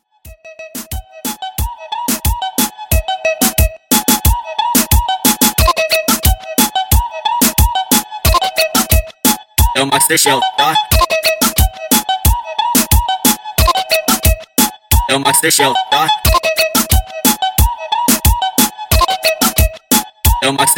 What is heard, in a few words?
Intro 90Bpm Clean